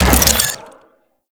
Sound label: Ball Projectile Hit; Warframe; Stalker